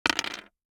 DICE on Hard Wood, Throw and Roll, Standard, 2 Two Dice; Articulated Sounds